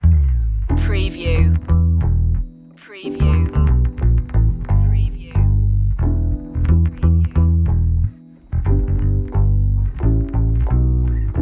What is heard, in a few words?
groovy no drums; Funky / Groovy